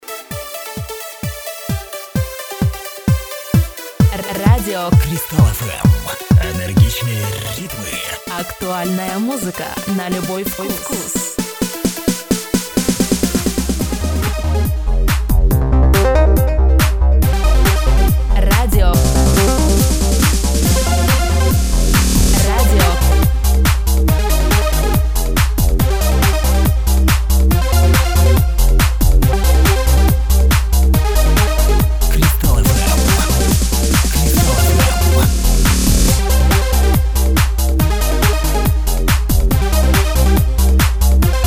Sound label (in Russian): Радио Jingle Mix